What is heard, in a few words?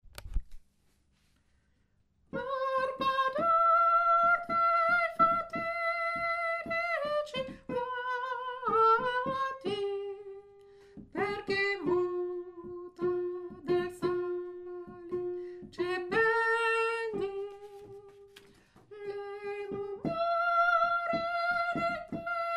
soprano